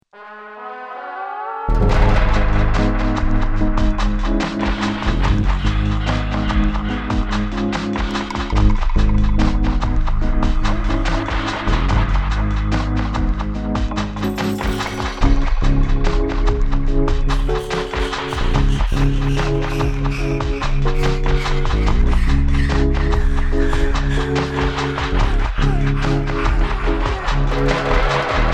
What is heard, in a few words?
Dub Breathing; Funky